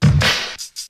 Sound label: hand clapper, Kick Snare; Drum loops